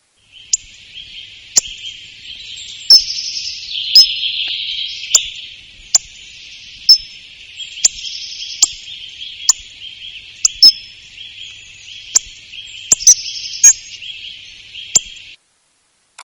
grobec

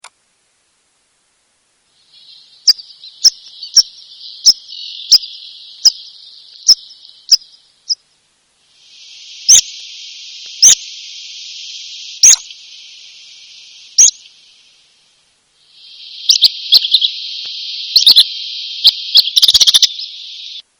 pipit maritime

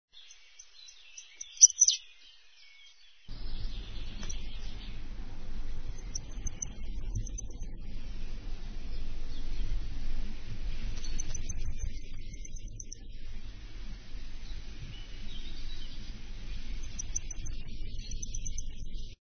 Roitelet triple bandeau, chant

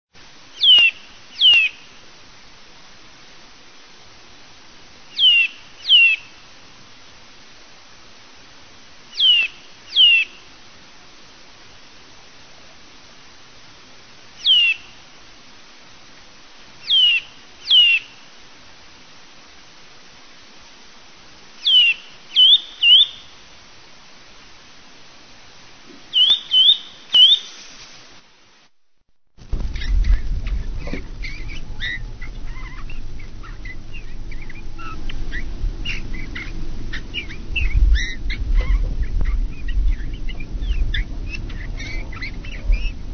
Bouvreuil pivoine, chant

